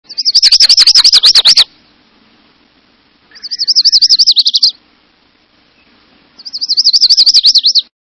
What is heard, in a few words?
Lucys Warbler